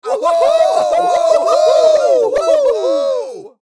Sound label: Wild crowd cheering